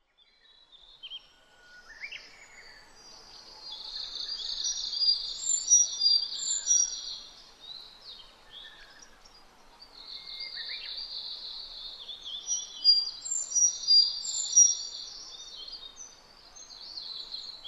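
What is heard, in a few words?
Sakhalin leaf warbler; ezomusikui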